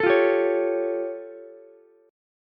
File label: A Augmented 7th A, Ukulele; strummed; 14,17,15,16; JGuitar